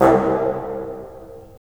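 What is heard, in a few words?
wind bassoon; LA Composer vol. 3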